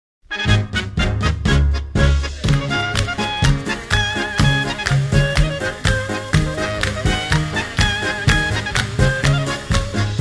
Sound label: Ballroom Polka